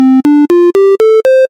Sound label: chromatic 5 scale